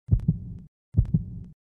fast beat

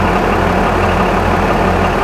tank, ambient

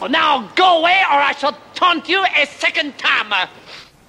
taunt

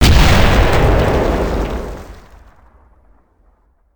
explode; hl2dm

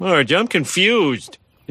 confused; simpsons

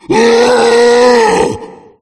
tank infect; csgo; zr

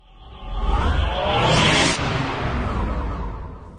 warp

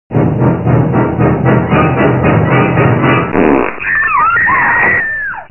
fart; hl2dm; jaws

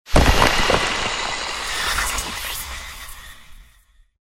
death; csgo; scp 173

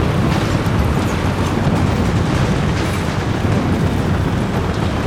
citybattle, ambient

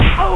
upercut

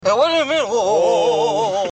oh oh oh

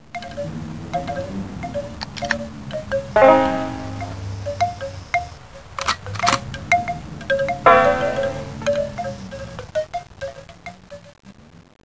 round start

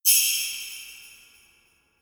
tree idle

